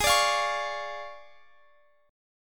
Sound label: D Minor Major 7th Flat 5th G sharp